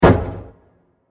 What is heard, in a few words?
Massive Stab With Reverb; Impacts; Blasts